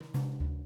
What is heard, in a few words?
110 LATIN, Living Drums